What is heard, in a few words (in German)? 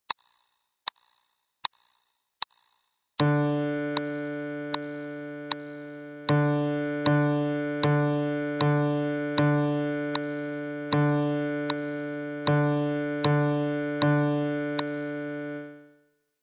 der rhythmus